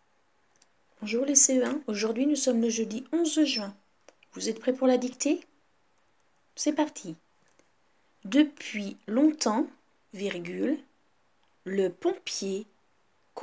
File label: Vocal